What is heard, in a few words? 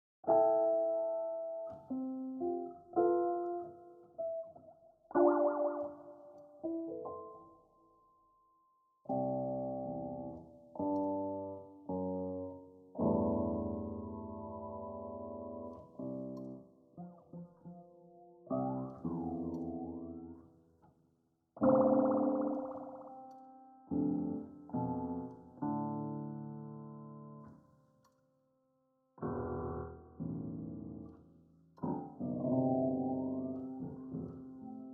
piano; Novel Piano